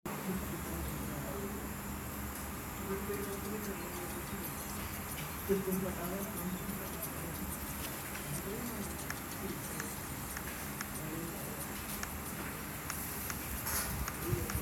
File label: karaoke recording